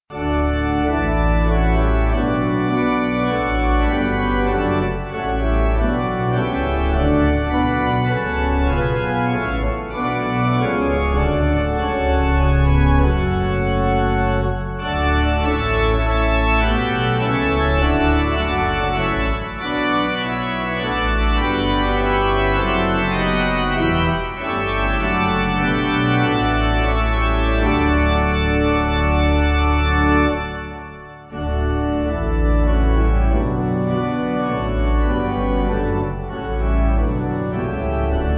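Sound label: Pipe Organ, Music Backing; We Sing Of God; Cornwall; Cornwall 8.8.6.D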